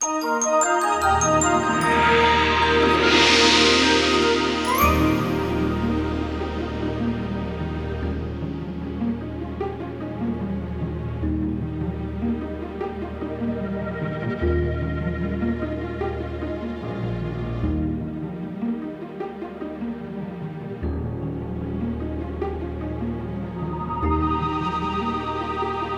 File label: Ident